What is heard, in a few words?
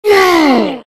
knight pain; halloween boss